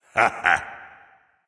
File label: "heh heh"; smite; voicepack; ullr